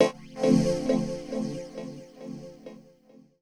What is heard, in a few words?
SPACE SYNTH 140 A; SpaceSounds